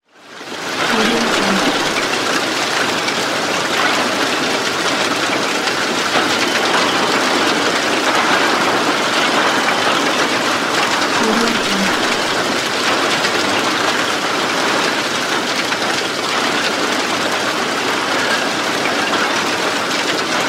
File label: building fire